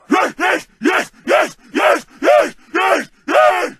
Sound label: zombie rage